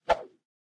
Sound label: victory swish; smite; kukulkan